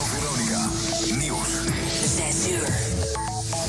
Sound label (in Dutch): Radio Veronica nieuwsjingle